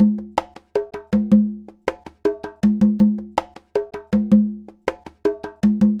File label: Conga, Latin Percussion